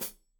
Hat Foot, Hi-Hat, Cymbals Stereo

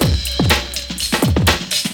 WISPER LOOP, BREAKBEATS; Crypton - DrumBase5000; BREAKBEATS#2

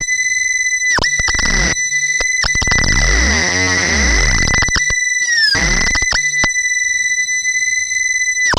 analog, special fx twisted; USB Soundscan; AKAI

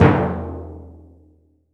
timpani E1; AKAI S-Series CD-ROM Sound Library VOL-5

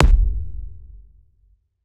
kick verb

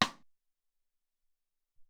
Dry Rim, Alesis SR-18

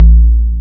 Moog BD; Quasimidi Rave-o-lution 309

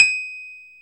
KEY MKS, Pop Pianos; Roland L-CD701

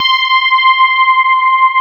SPARKLES; Wizoo - Powered DX

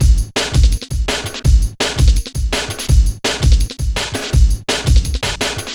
Hard Stepz, Drum Loops 166.5; Zero-G Jungle Warfare 1, 2 & 3

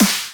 Dubstep Snare

